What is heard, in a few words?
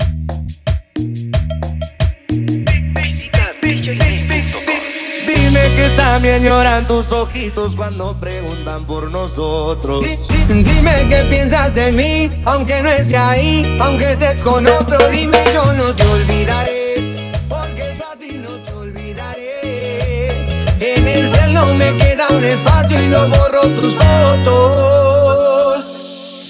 Acapella